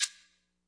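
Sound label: classic hip hop shaker; STYLE KITS; Classic Hip Hop Mega Kit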